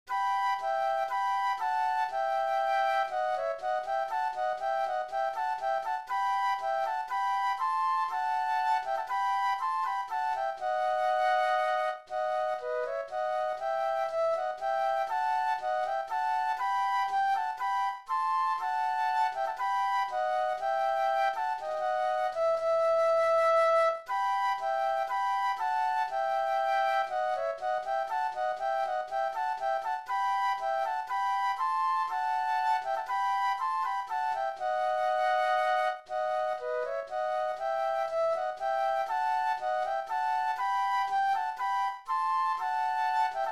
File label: Minuet Flute Duet; classical